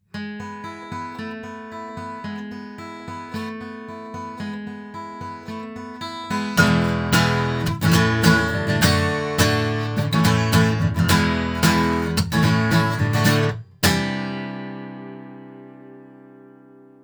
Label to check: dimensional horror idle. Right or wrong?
Wrong